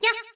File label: "yah"; toadette; sm64coopdx; char-select-extra-chars